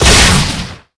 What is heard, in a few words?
Launch; Quantum Redshift; XBOX